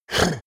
male snort; menschen; koerpergeraeusche

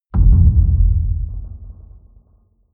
boom low impact; hits explosionen

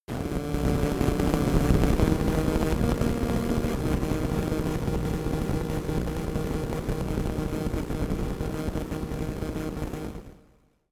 static crackle noise long, sciene fiction

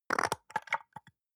soap dispenser press almost empty; haus-garten; badezimmer

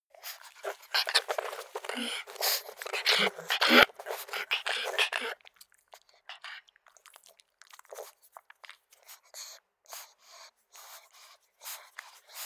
pug pant smack; natur-tiere; hunde

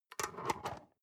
storage lid slide close medium, box; objekte

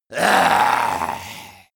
male die; koerpergeraeusche; menschen; soundpool

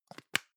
plastic can lid screw close medium; objekte; dose

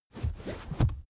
car armrest backseat open fast

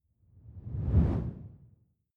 whoosh simple incoming low; swooshes-swishes

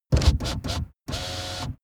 car window back open unsteady

